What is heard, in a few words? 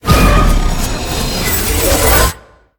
activate; smite; god tiamat